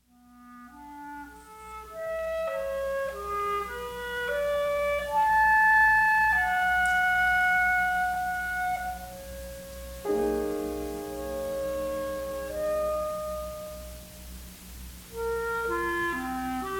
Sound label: Clarinet and Piano Sonata